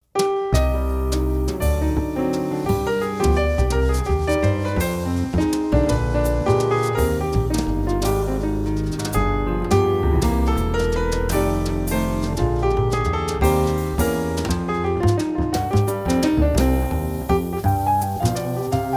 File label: Waltz – Jazz Style; New Age Band; Chopin